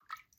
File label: water